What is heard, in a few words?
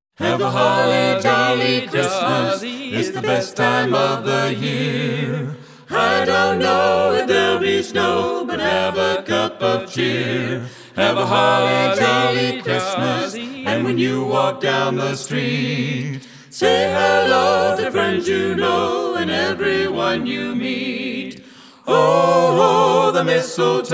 Carolers; Las Vegas